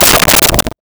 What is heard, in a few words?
Plastic Roll, Crashes